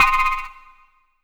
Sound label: LEAD Slow Interval; Polyend; Plughugger